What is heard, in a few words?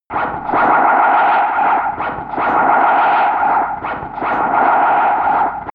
earth miserable radiofrequency connection